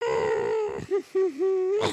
indignant, emotes

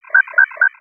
Launch Pad Beeps; gnarled forest

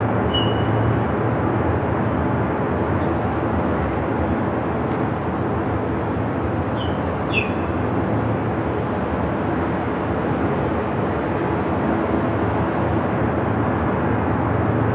wing, ambience; Ninjago

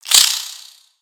Spider Attack Ranged; Lego; creatures mechanical

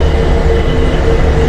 3D Teleporter Tube, ambience; nexus tower

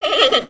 Pets Bear Panda Happy; creatures; Lego

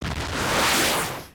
Jetpack Blastoff

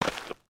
Foot Dirt Sneaker Walk, footsteps

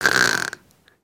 snore in

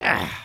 Walk, creatures organic

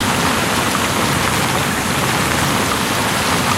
water tread, vehicles; aronwk collection; ndaudio

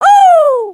celebrate

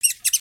Pet Rabbit Speak

